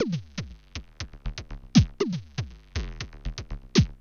Percussive

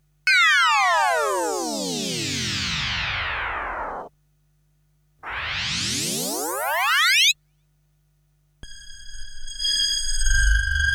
Sound Effects; Kniteforce; 1000 Samples Volume 1

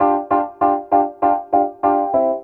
KEYB L, Roots Funk; USB Soundscan vol.41 - Roots Funk; AKAI

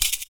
FOOT PERC; Univers Sons - Raricussions

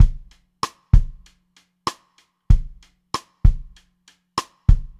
BEAT, Pop-Rock Drumloops; USB Soundscan vol.37; AKAI; 96 POP

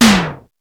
DRUMZ, ENSONIQ ESQ-1; Rubber Chicken Software Sample Bank

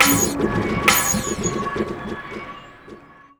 BUBBLE; USB Soundscan vol.33; Disjointed Textures; AKAI

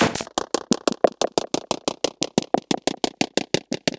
Modular Noise Rhythm (120BPM)

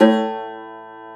Cumbus Saz A1; Planet Earth; Digital Sound Factory Planet Earth

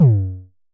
Festival Kicks - F#2, Kicks, Vermona Kick Lancet; LookIMadeAThing Kicks

